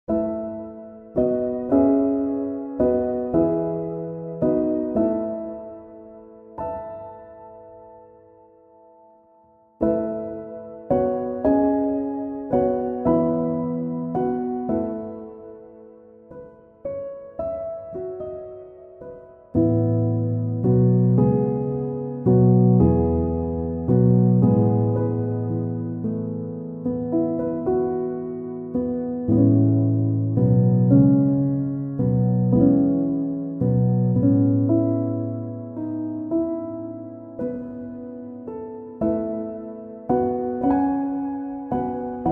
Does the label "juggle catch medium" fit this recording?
No